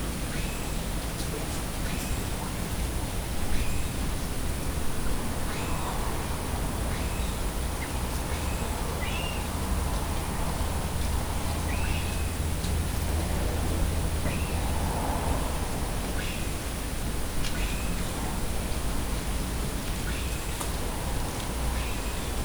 falevelek padlason ragcsalok; perkupa

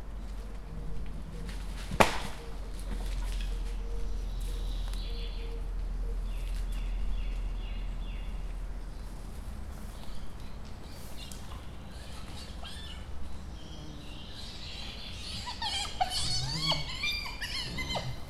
hiéna etetése, kacaj, foltos hiéna

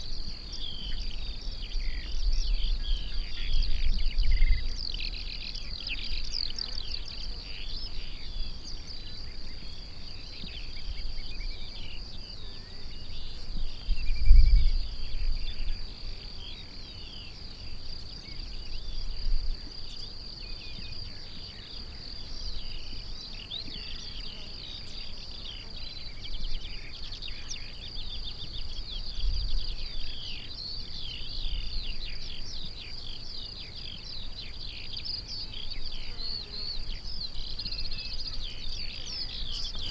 jobbról és balról is rovarok viszonylagos szélcsend távolban mennydörgés gémeskutaknál; hortobagyi puszta

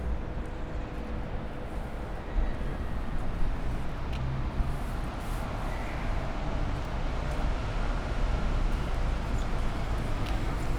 béke téri megálló kívülről váci út felé, busz

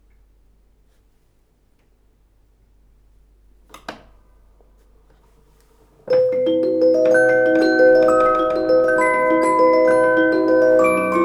zenedoboz hengeres dallamu; verkli

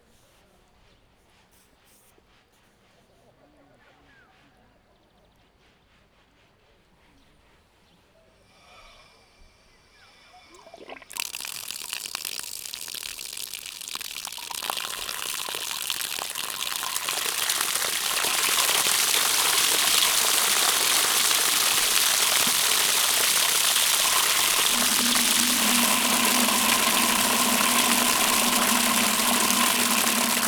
rövid kar fokozatosan, artézi kút; szogliget

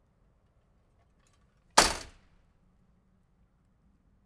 kertikapu

